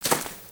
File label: Footstep; Lego; Strombie; creatures organic